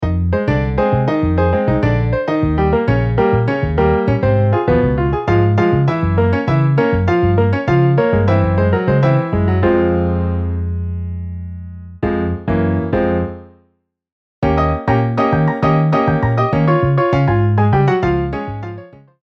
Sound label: Piano Bass MIDI